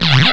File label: bite